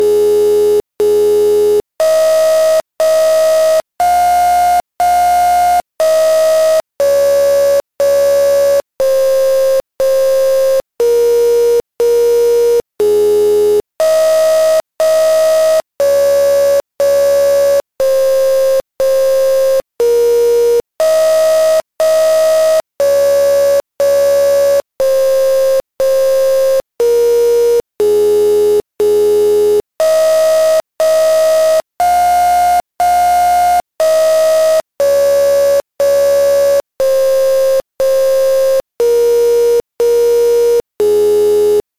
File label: nano piano song